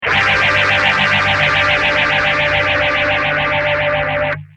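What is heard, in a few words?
guitar, Mutron phasor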